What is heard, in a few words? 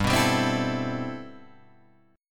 C Major 13th G